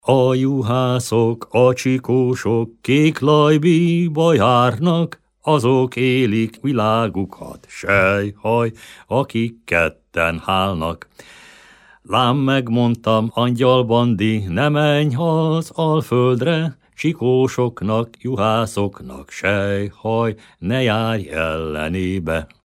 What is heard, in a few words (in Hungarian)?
nepdal; a juhászok a csikósok